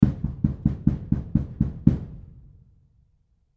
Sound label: Door Knock Inside Perspective Hallway School; Door Knock Inside Perspective Hallway School Building; Door Knocking; Foley